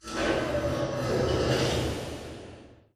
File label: Robot Arm Right Deliver Dist; Boomtown; Titanfall 2